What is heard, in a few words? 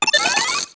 Scoreboard high scores; LittleBigPlanet 2; PS3